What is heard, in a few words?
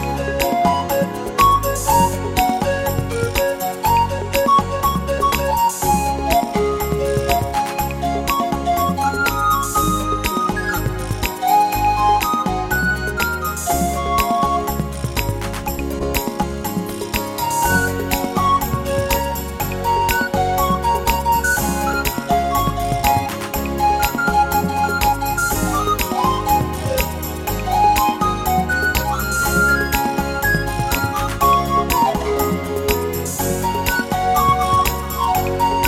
Modern Beguine